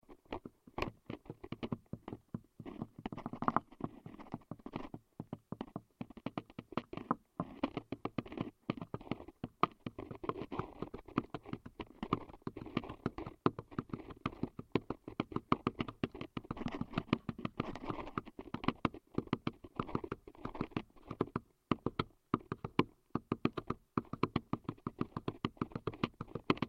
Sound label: tapping on desk with contact mic